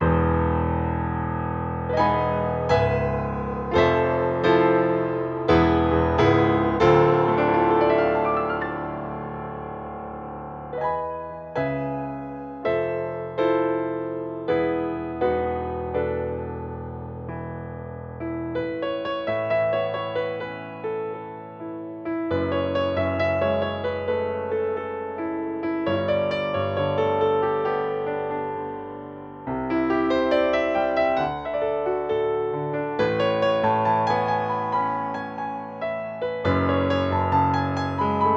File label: Pianist Pop